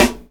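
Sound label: snare drum funk; E-Lab; X Static Goldmine 2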